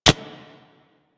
Throwing Dagger Idle Catch; Warframe; Weapons

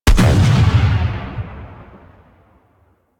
Revenant Shotgun Alt Proj Explosion; Warframe; Tenno

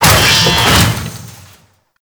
Necramech Ranged Hit React; Warframe

